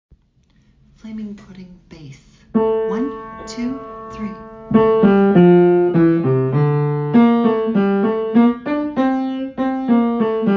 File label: bass